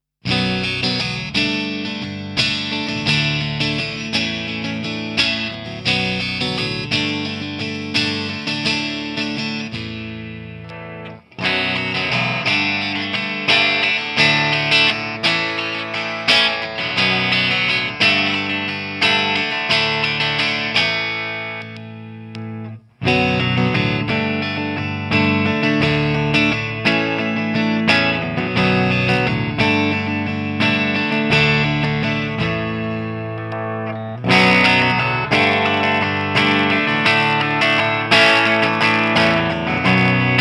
ML3A Baritone Clean Single Coil Humbucker; Chapman Guitars

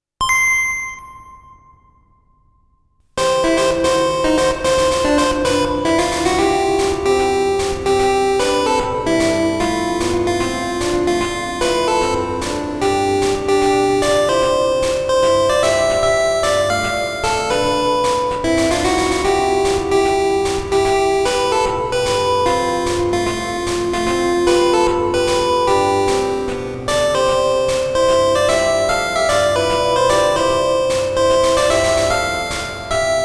Sony DRE 2000 Game Boy Reverb Settings

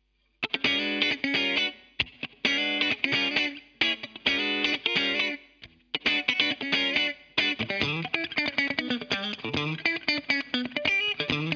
Got The Funk; TwoNotes OPUS

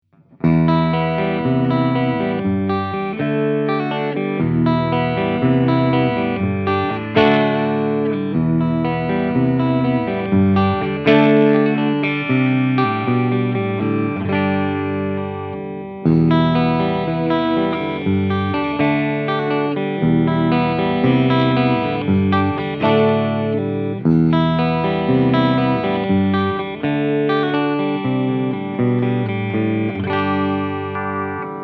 BC Rich Mockingbird Humbucker 3 Way Switch

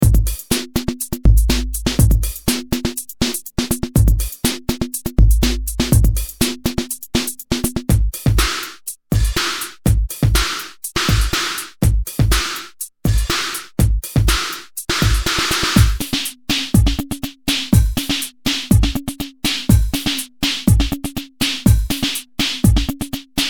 Snares

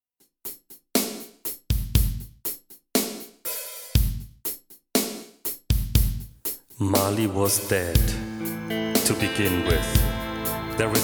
Christmas Carol

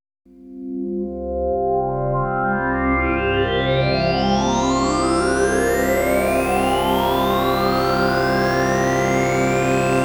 Synthesizer Sweep

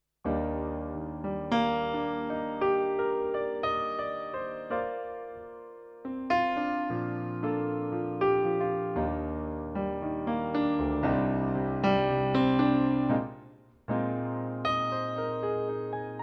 Piano

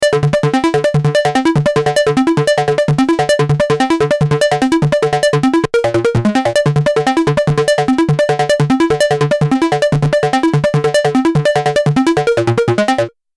Chandler TG 1 Seq. THD THD

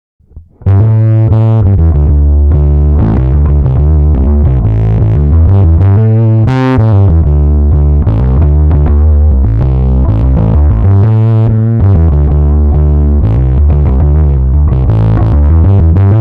Ampeg PF J Bass Dist

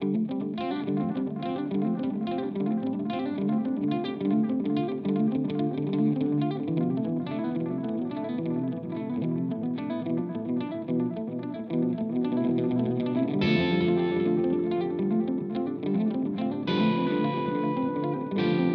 MXR Carbon Copy

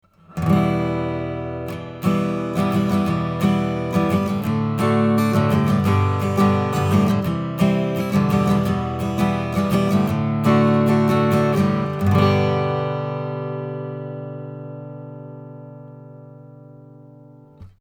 Premier GA47 Acoustic Guitar 60cm; Golden Age

